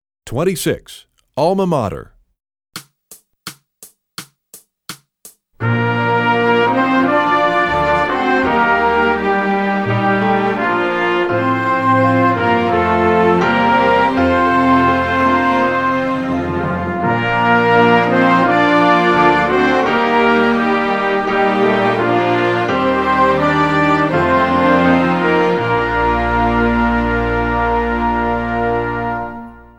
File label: trombone; essential elements